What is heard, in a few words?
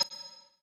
delete